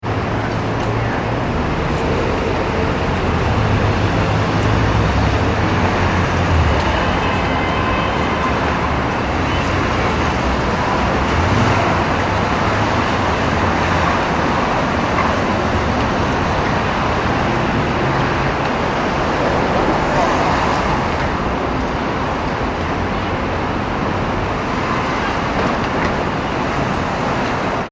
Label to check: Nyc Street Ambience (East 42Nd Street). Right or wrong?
Right